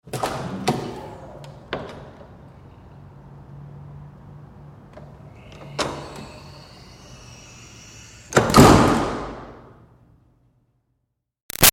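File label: Door Metal Commercial Push Interior Hard Open Close; Metal Doors; Big Room Sound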